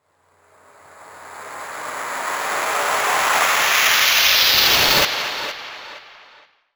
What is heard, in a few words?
FX Uplifter